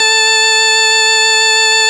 VOX 2468 A3, VOX CONTINTL, Vintage Keyboards; Big Fish Audio; First Call Vintage Keyboards

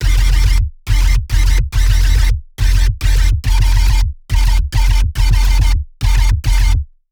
F loop phaser 40 bpm, bass

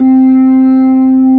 B3, Drawbars

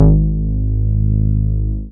BAS MINI, Mini-Moog

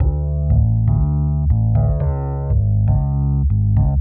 Rhodes Bass D; PowerFX - Nu Jazz House

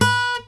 pop, Guitar

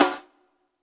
JV KIT; Pro-Rec - Synthsonic; JV ANALOG1

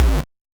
Noise, 8-bit, Bitty Noises; musicradar; 8-bit bonanza

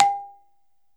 Balafon; E-MU Producer Series Vol. 7 – Old World Instruments

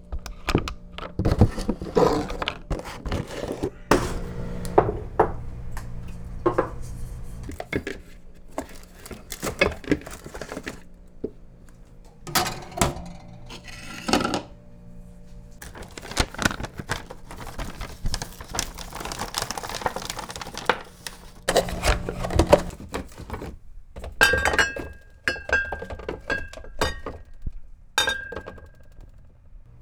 Clunk, kitchen

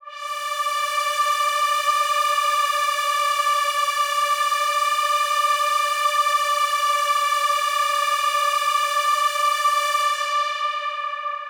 Big Pad D4; KG MegaSaur

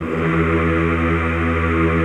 E1, Classical Choir; Best Service; Extended Classical Choir

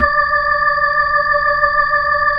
B-3 Chorus

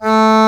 HORN A2, SYNTHESIZER LEAD

